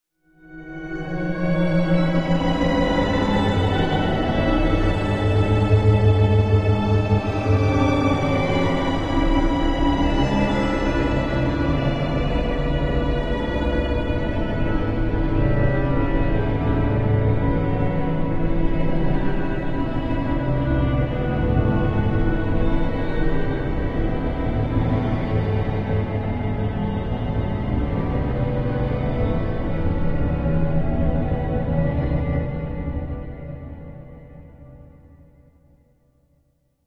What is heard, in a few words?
Music Eerie Slow, orchestral